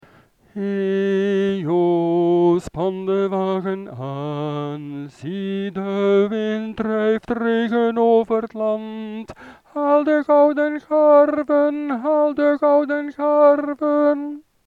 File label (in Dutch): hejo span de wagen aan zang eenstemmig; muziek; herfstliederen